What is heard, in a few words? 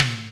utah, Toms Electro